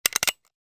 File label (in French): clicks Levier